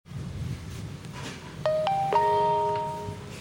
Ripple Oppo SMS

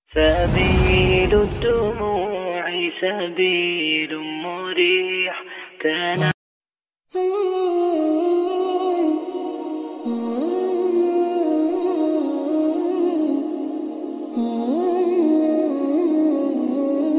Beautiful Islamic

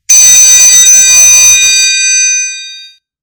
Nokia 8.3 Sms

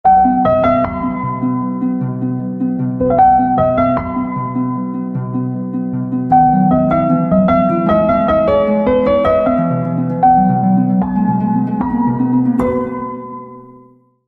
Honor 80 SE, ringtone

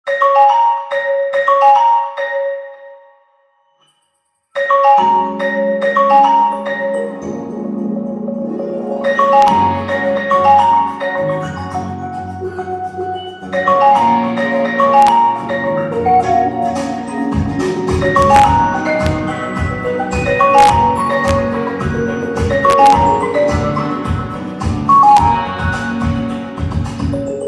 Vivo iQOO Z6 Lite

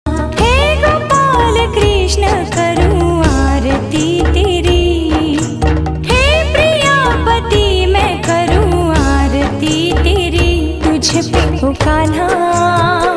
Hey Gopal Krishna Karu Aarti Teri; devotional ringtones

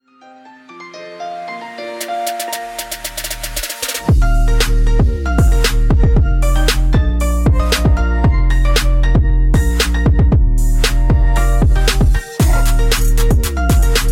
Nokia Bass Mix Music

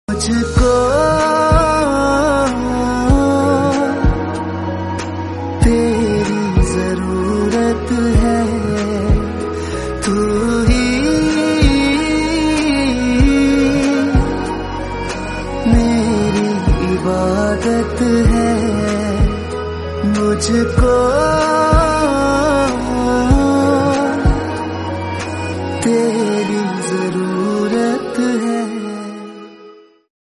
Flute

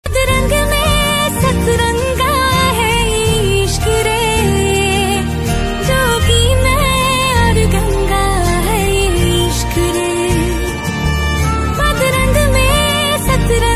female version ringtone

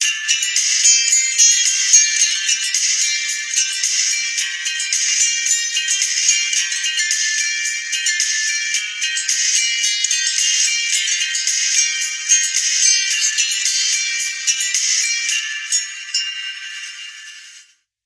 Ringtone

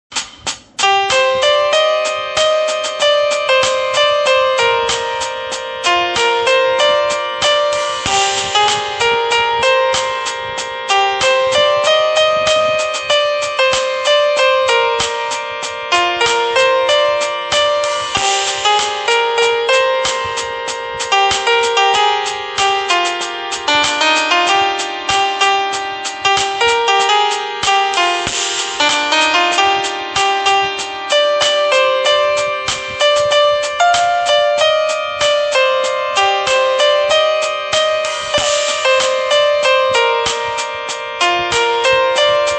Instrumental

